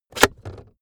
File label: car backseat table pull out cupholder